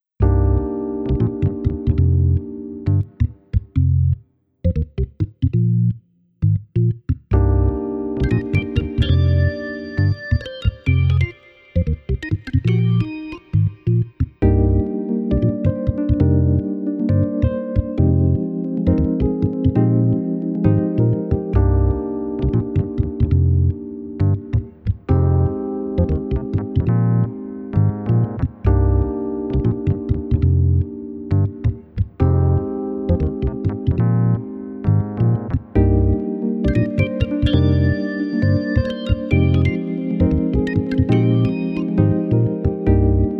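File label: backing track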